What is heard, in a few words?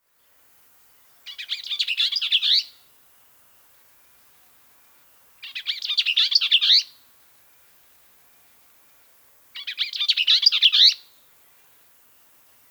Bells Vireo Vireo bellii